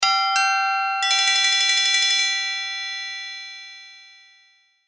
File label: triangle